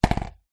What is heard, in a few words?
sport skateboard small plastic childs down on ground